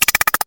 burn tick, status effects; freepixel

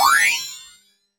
heal, magic spells

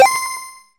regen pulse, status effects; freepixel

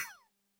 plasma blast, sci-fi

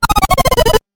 sleep zzz; Status Effects; freepixel

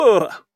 emote angry; Multiplayer

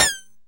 hit light; Combat; combat impacts

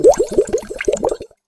potion brew; Crafting

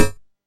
punch; Character Actions

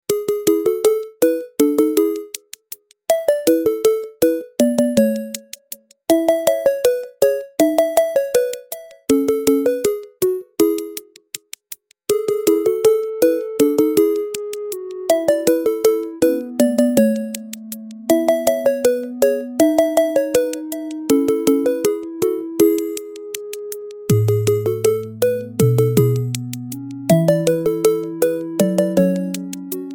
puzzle game; freepixel; Casual

